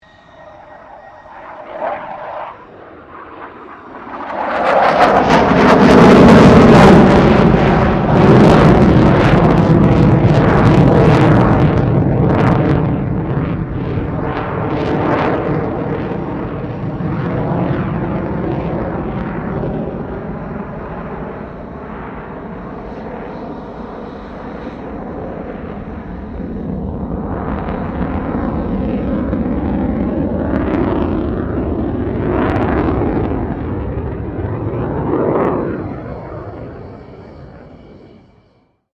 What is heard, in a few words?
2009 FA-18E SUPERHORNET PASS; RAAF FA-18E Super Hornet; Airplanes; Vehicles